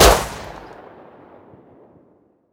fire alt; Rust

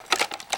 reload lift, foley; mg4

